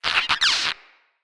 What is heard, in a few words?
Mine Osprey Spotted; Characters; Corpus